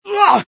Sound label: taunt flip exert; scout